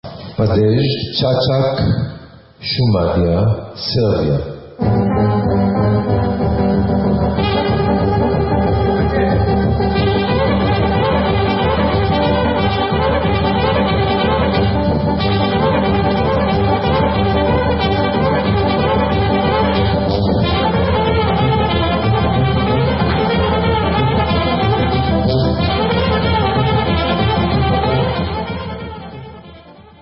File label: Padez Cacak Serbian Instrumental